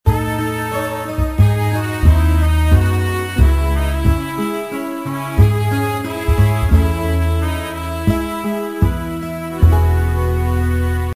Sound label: playing the horn